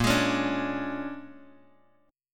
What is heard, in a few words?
Bb 7th Sharp 9th Bb, Bass Standard; Bb7#9; strummed; JGuitar